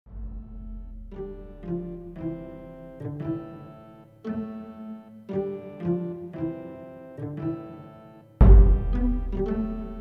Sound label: Battle Anthem; Besieger